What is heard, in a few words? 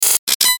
Glitch Buzz Beeps; Blastwave; Glitches